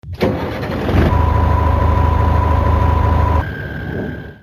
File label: turbo diesel